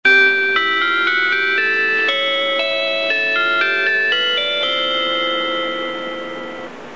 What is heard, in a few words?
train chime; スーパーひたち; ６５１系のチャイムです。